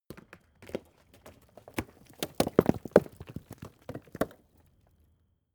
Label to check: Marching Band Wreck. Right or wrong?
Wrong